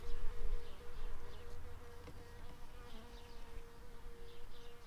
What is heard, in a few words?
Recording, bumblebees, Sylvarum workers